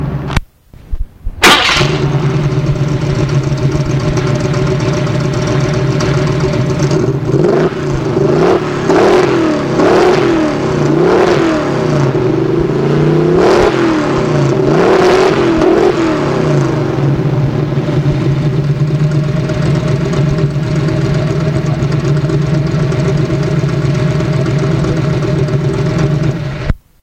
Ford Mustang Mach1

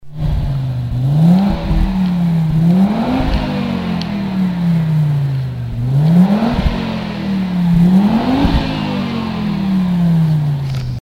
BMW E21 B6 2.6l

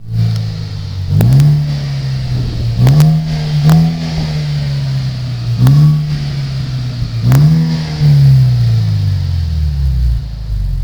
Audi A4 8E 2L

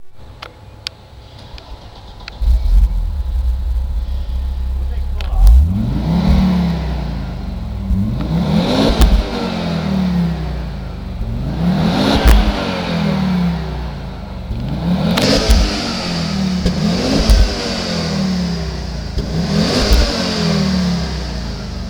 Audi 90 Coupe

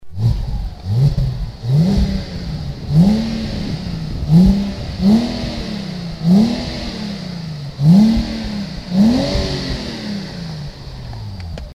Audi A4 3l

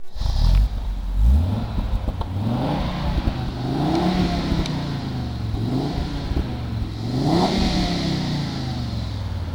Audi Cabrio 2.3l

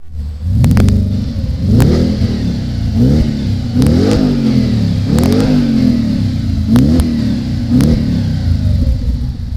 Audi A4 8e S4

